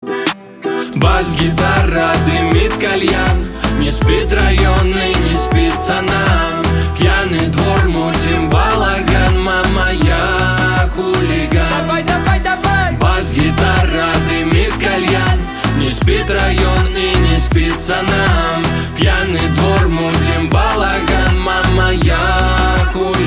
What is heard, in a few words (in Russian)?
Бас гитара, дымит кальян